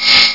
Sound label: slash